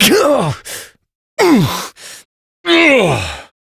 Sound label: struggle long adult; New War; Adult Operator